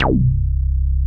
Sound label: MOOG C1, ANALOG BASS; Music Store - Claudius Diemeter Schwerlos